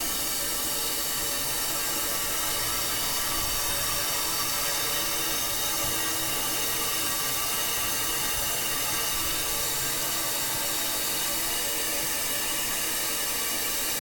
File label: steam pipe; Storage Hall